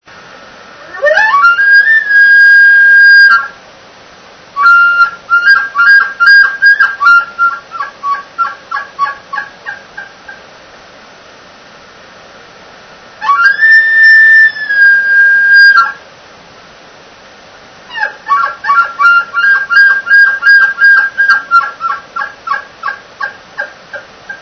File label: bull, elk; elk contest; elk 2001